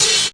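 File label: Yamaha RS7000 hi-hat cymbal C5; Drum machines; Yamaha RS7000 Cymbals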